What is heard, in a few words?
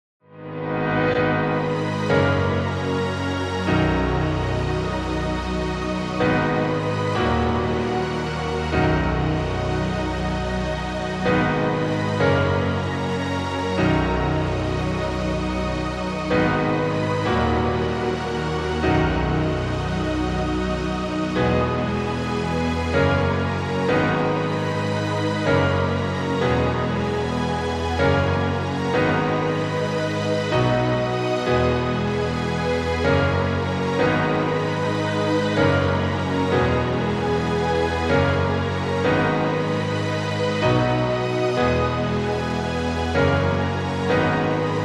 Piano Theme Mix